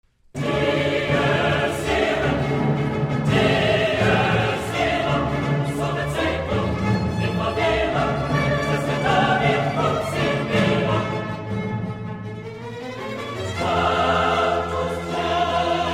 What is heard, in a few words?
Requiem - Sequence - Dies irae; Boston Baroque; Mozart Requiem